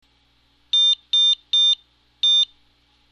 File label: pipipi pi